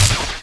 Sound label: jet gun end, weapons